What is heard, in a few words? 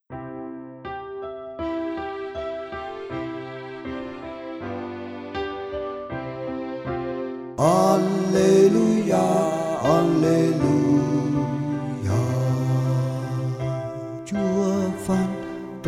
alleluia song